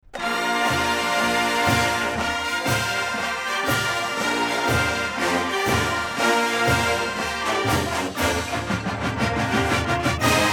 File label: Fanfare; MSU